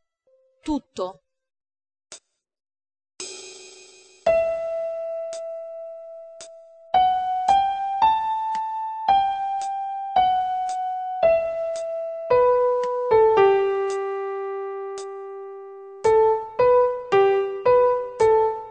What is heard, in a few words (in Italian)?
sincope 4/4 Em